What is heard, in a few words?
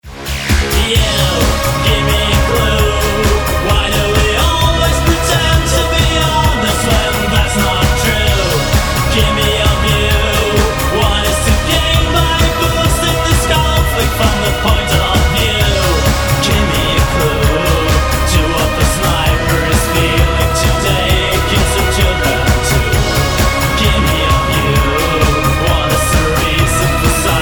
Dramatic